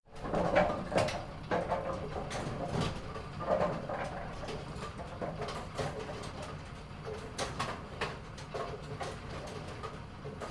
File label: bowling pin set